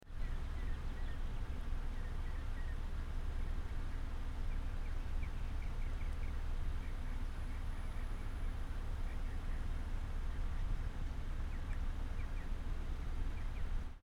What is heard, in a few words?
Prius 5 MPH Right To Left Noise Added